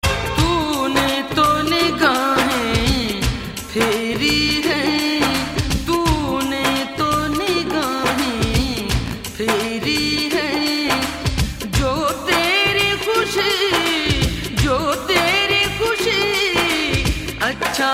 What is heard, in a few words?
Sufi Tone